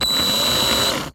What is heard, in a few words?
Drill